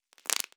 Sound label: Multiple Small Pops